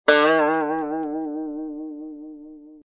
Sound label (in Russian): neudacha odna struna bandzho